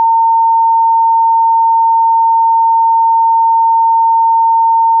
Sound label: 910 hz; 96Khz; 32bit; test-tones